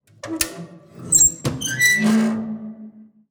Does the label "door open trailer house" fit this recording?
Yes